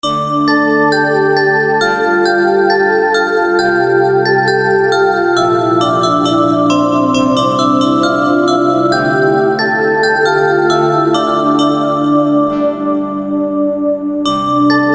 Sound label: sweet calm melody alarm